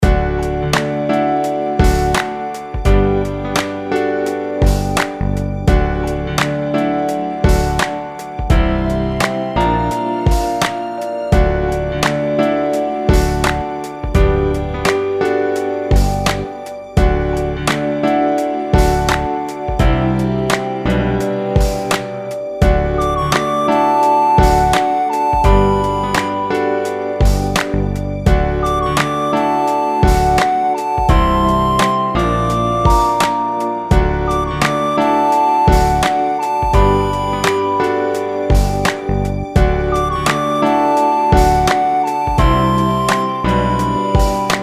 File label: Neutral Pop Beat Main Loop